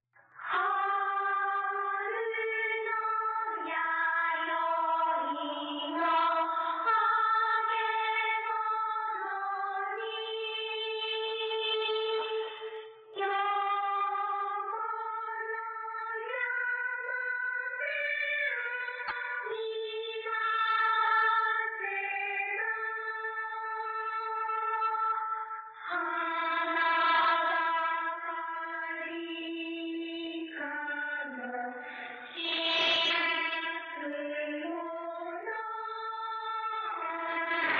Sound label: home music